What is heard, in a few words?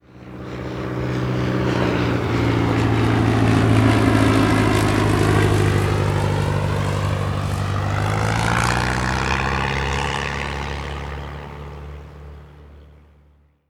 propeller plane fly